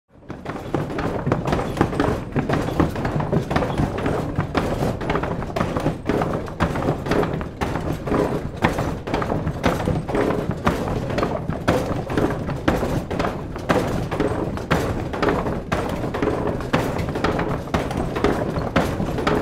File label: Rolling, نورد آهن